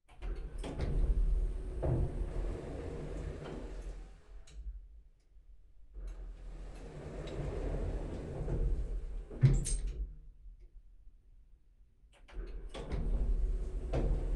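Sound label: elevator interior elevator door open close mechanism near stereo; Sonniss GDC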